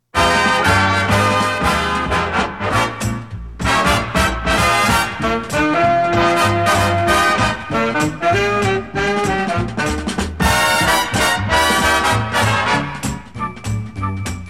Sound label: Jingle